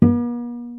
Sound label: pizz cello; DirectX SDK; DMusProd